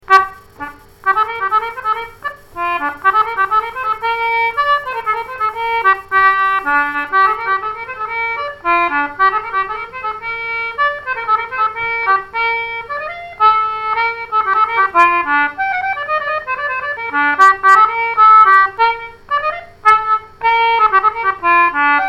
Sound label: hornpipe; Booths hornpipe